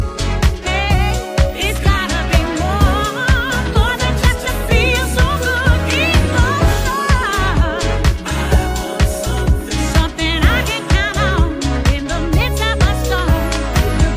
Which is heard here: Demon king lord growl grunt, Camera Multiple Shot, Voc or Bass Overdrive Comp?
Voc